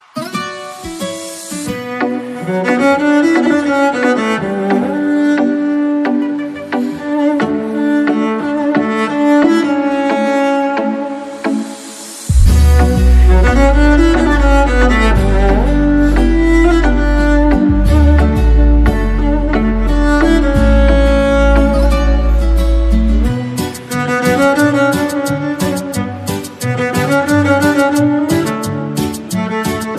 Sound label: Mobile Ringtone